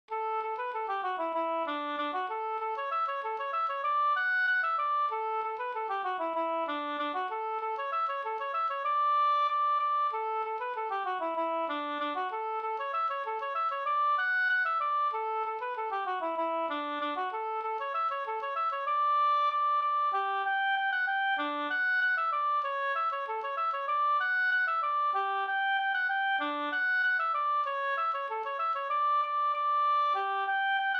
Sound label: polka; fr Aland fi